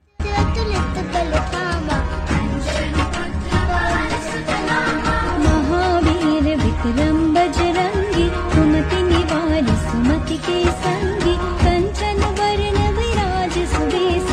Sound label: hanuman chalisa ringtone